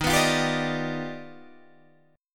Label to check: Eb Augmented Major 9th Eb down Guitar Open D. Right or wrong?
Right